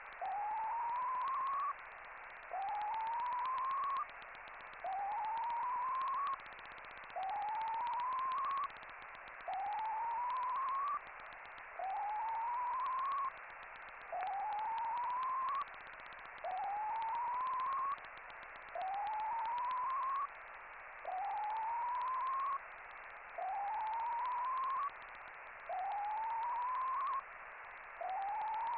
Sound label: whoop